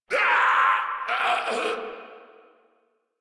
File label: Death, Grineer Male; Warframe